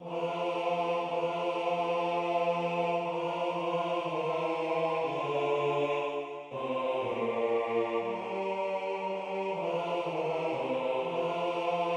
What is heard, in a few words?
Intercession Bass